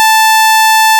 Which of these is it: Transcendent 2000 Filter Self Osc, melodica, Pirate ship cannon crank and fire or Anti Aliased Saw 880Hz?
Anti Aliased Saw 880Hz